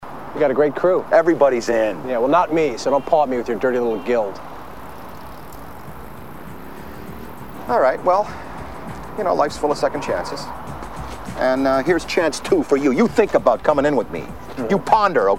popcorn

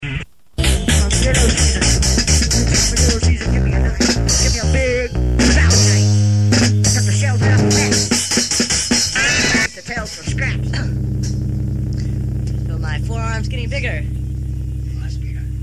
bass machine

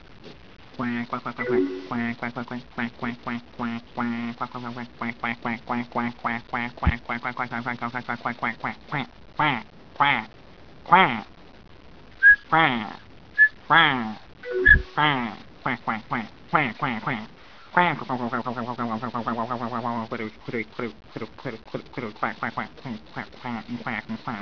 excessive quack

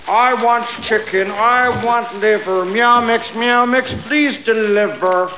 meow; meow mix

